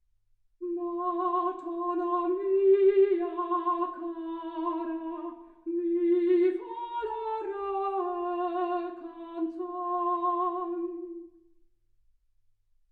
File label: soprano